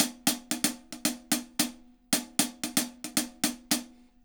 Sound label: HAT, HI HAT LOOPS, Dub; Drumdrops In Dub VOL-1